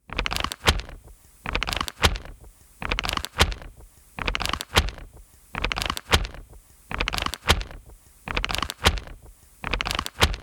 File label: Paper Snap